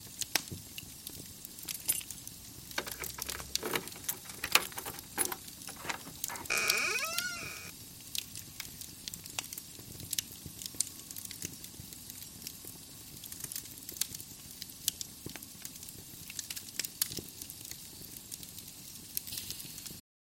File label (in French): Cheminée clés porte